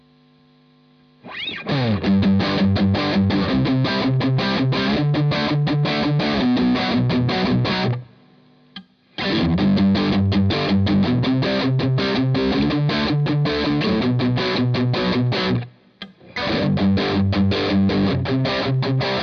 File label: Rock Riff